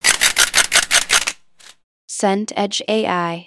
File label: camera burst